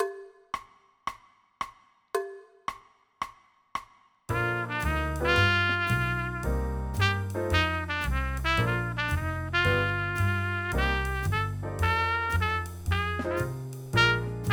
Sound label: Junior Jazz Etude; ILMEA